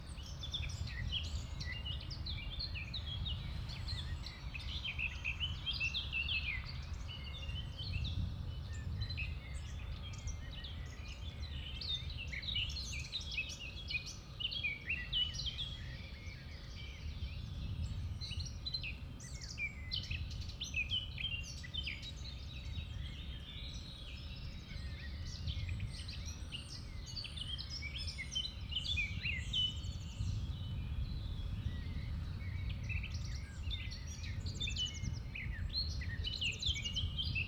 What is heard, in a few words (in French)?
chant oiseaux